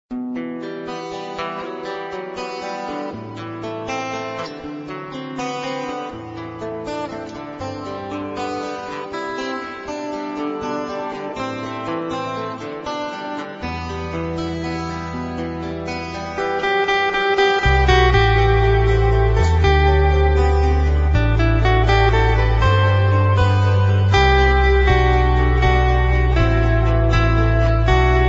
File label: Soft Playing